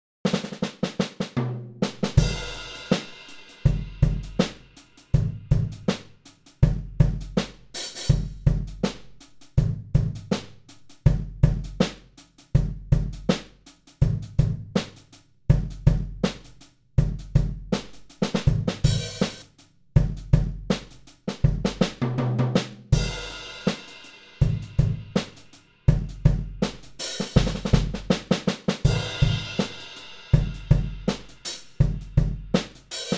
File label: 70S FUNK